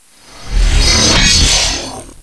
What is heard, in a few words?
thunder gun fx